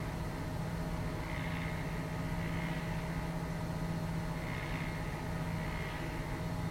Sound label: Monitor T V